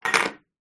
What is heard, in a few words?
household shaving gel can drop in empty basin